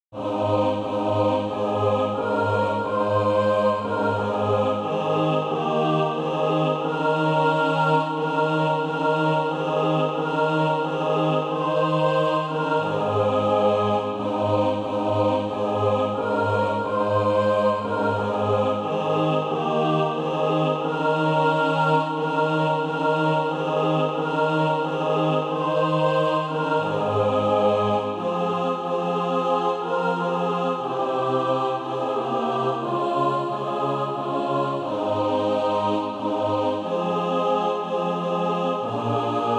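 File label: mixed, Practise Music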